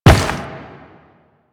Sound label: Step Rock, Footsteps; Warframe; Quests; The New War; Kahl